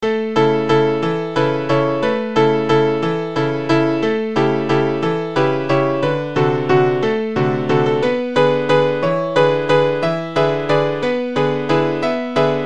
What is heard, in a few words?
with melody